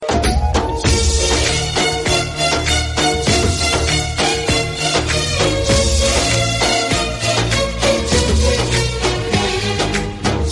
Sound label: Romantic Arabic Instrumental Ringtone